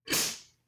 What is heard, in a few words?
snake attack; soundscrate